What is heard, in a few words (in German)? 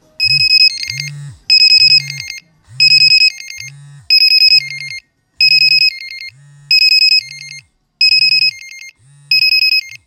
POCSAG Alarmton Nicht Dringend